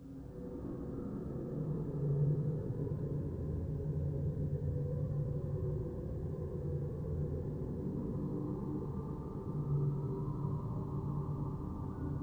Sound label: creepy background music